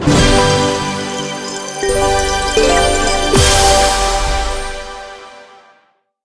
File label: large Win; dazzle